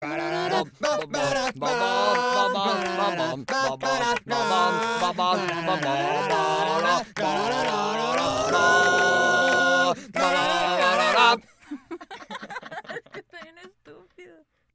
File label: funny